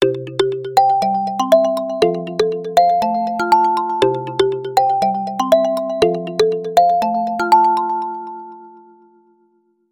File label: Field Trip, Black Shark